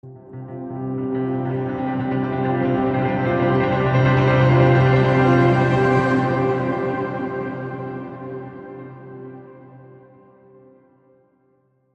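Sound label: Heavenly Crescendo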